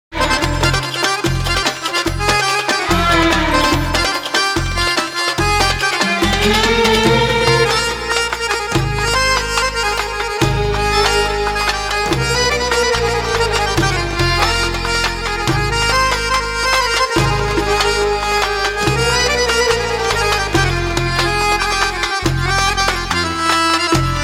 Arabian Piece

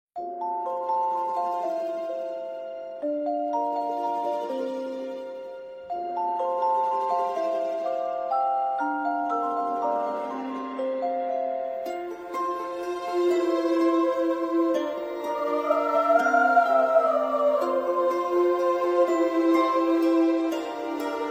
Soft Music

